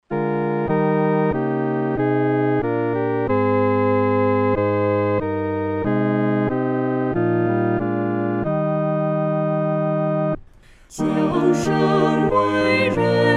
合唱 四声部

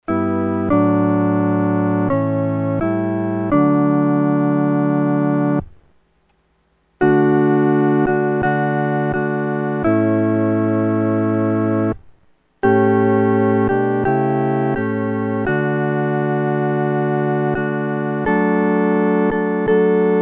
合奏 四声部; 垂听我祷告

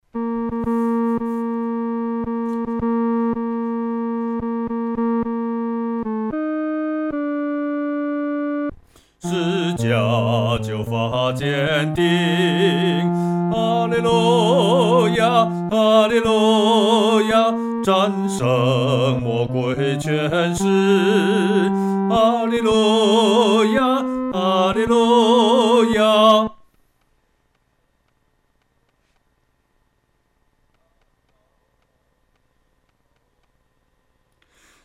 独唱 第三声

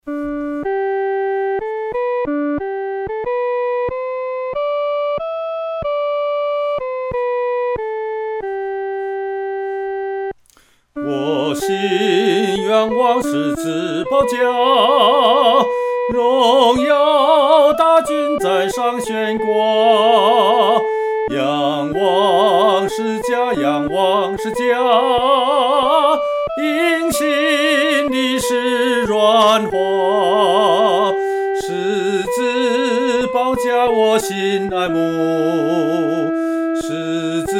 独唱 第一声

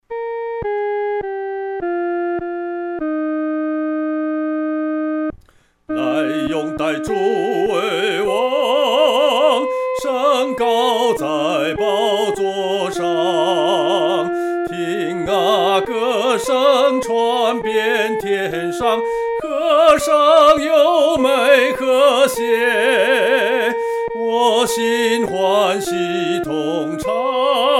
独唱 第一声

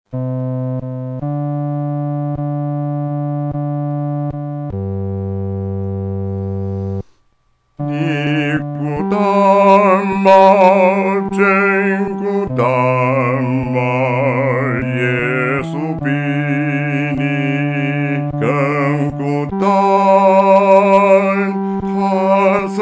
独唱 第四声

